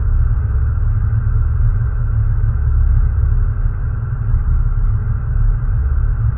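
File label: alien ambience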